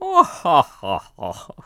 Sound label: Laugh; Match Master laughing